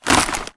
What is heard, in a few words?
hack spectre armorrattle; dataknife; Titanfall 2